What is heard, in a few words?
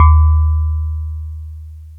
DX PIANO